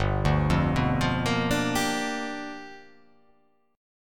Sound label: G 7th Sharp 9th G chord